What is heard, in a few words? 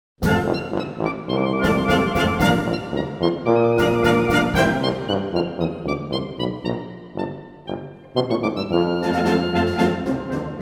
claruba